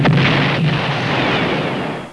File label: bomb; Guns and bombs